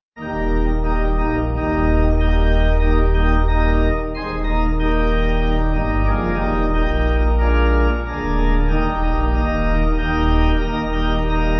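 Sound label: Pipe Organ, Music Backing Files for Churches; Diligence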